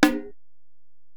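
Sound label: Acoustic Percussion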